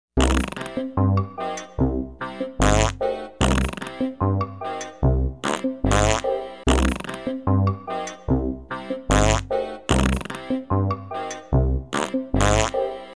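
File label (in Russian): забавный смешной пердящий звук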